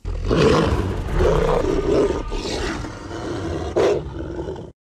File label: Dog roar adult